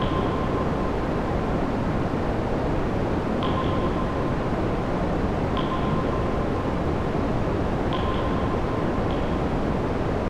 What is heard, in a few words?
Sewer; Cruelty Squad